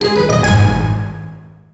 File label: Stinger Up; Deal or No Deal Special Edition; NDS